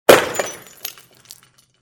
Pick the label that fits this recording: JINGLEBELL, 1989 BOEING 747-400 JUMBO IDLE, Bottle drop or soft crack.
Bottle drop